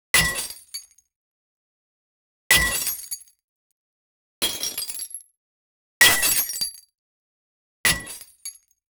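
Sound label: breaking glass and falling, glass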